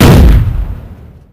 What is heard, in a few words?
split to 4; eiffelmedia; brick breaker